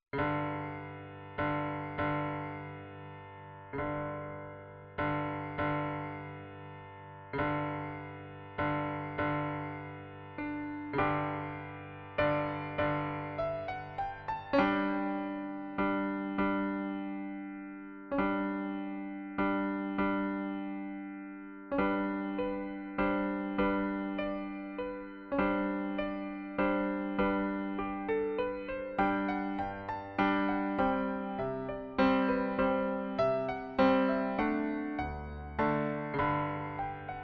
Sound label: Die Hirten auf dem Felde piano